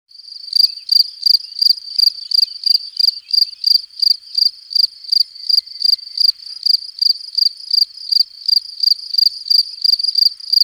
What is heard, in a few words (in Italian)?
Grillo Campestre grillo campestris; Versi Animali; Il Forum della Fotografia Naturalistica